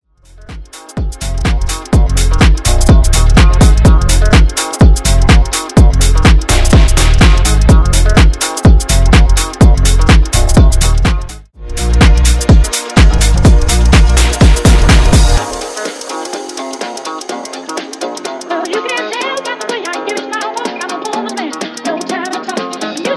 Bass House 125BPM; SNIPPET

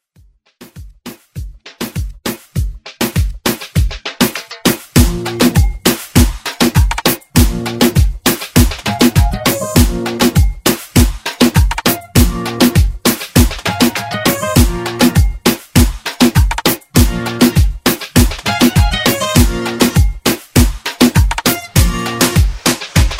Reggaeton Redrum

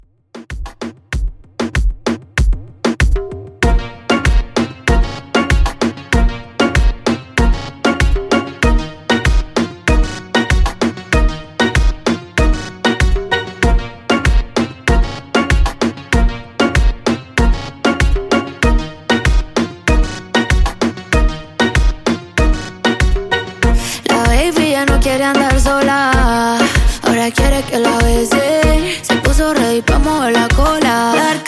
Reggaeton 96Bpm

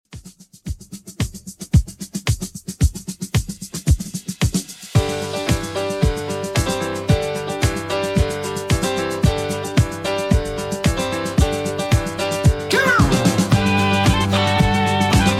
70s Redrum 112bpm CLEAN

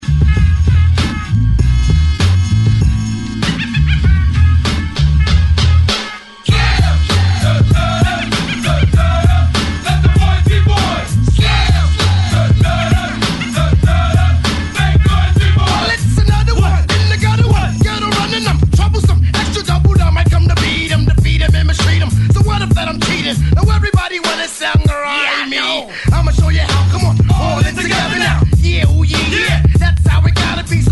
90s Intro 98 Bpm; DJDOLLS

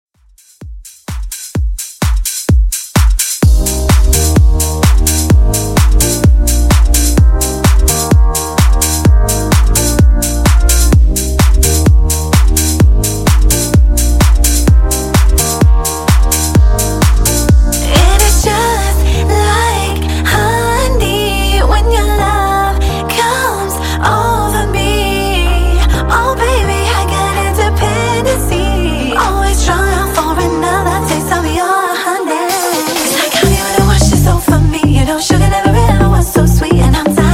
Funk Intro Outro Edit 128 Bpm

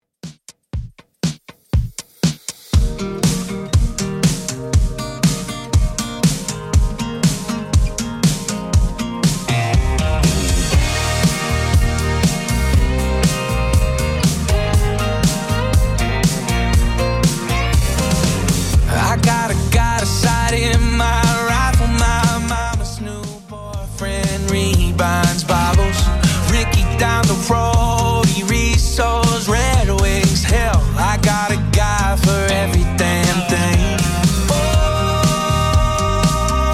Top40 Redrum 120bpm

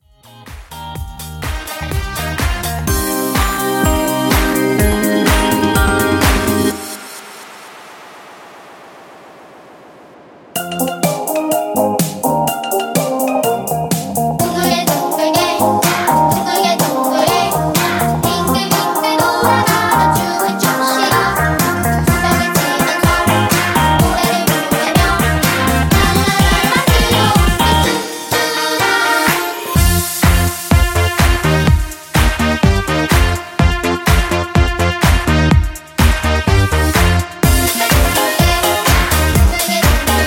Party Mashup 125 Bpm

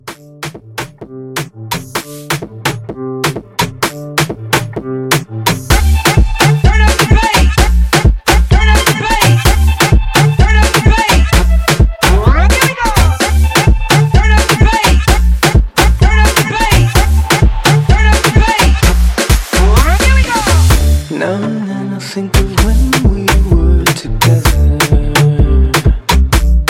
Afro Hype Edit 128

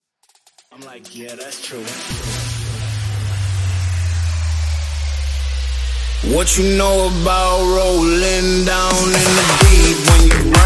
Top40 Bounce Mashup

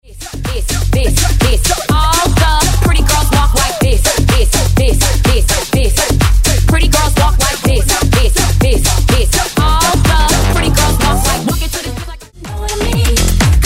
Pop Top40 House Megamix

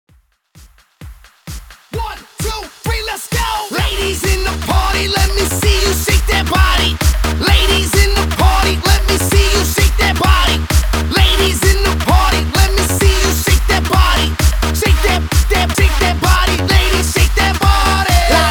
130 BPM

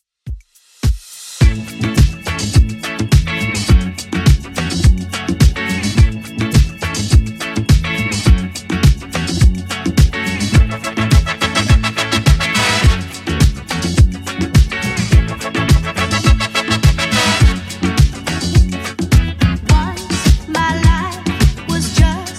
70s Redrum 105bpm CLEAN

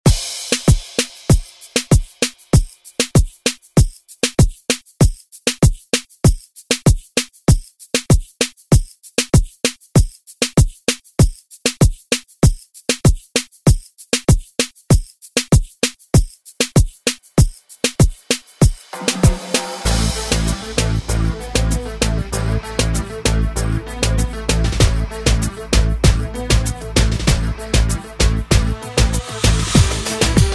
Re Drum

